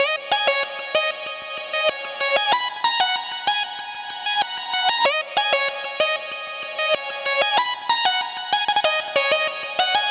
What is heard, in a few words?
reversed lead